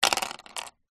leisure marbles glass x5 drop into plastic container